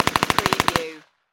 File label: Machine Gun UZI Model A Long Burst